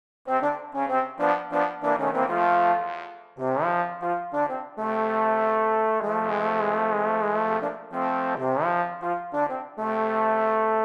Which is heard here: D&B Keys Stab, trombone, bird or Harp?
trombone